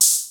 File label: Hi Hat, Cwejman S1 MK2, Drum Machines; 300 Drum Machines